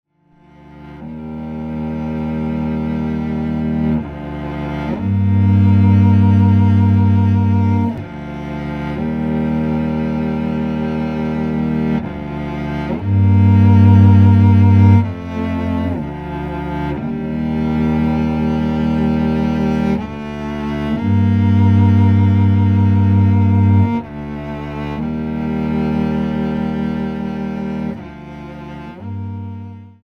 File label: Cello; Loss